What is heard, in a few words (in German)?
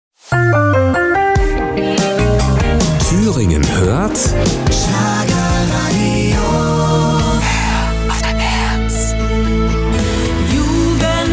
DEMO MUSIK